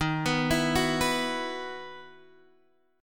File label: E Major 7th Suspended 2nd